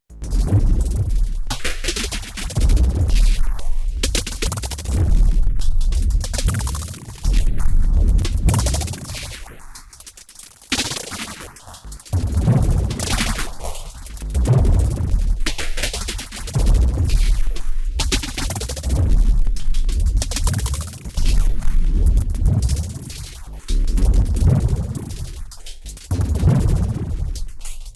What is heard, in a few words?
Erica Synths Black Hole DSP2 Drums massive contortion